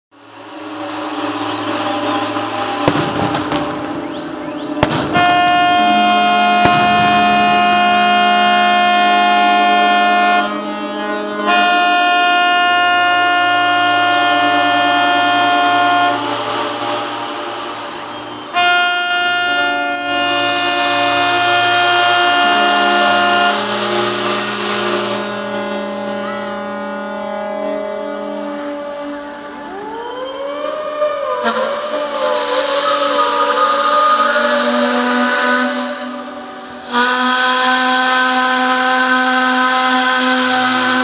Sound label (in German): Dampfersirenen